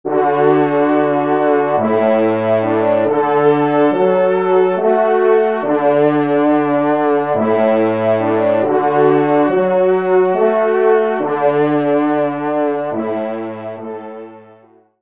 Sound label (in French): Kyrie, trompe, BASSE; Poujol; Kyrie messe de Poujol